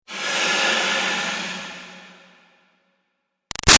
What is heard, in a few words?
Demon Breath Reverb; Alien Sound Effects; BigRoomSound